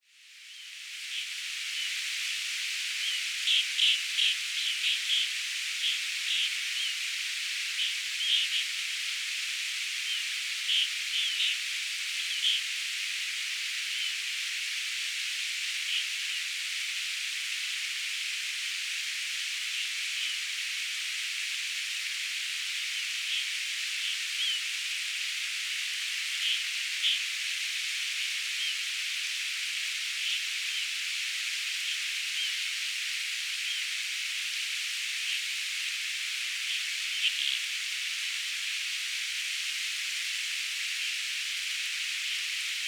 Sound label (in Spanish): Pato torrentero Merganetta armata armata